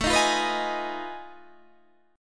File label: F 7th Sharp 9th Flat 5th A